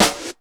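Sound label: GATE snare; Crypton - DrumBase5000; GATE KITS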